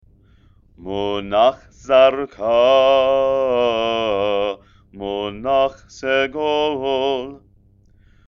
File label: haftarah, trope